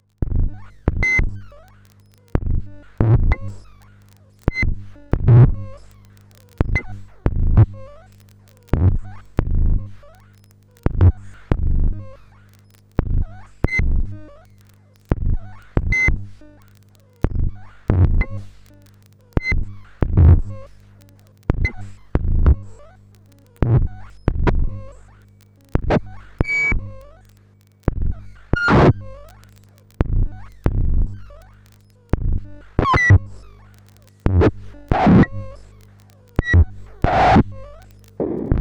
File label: mute loops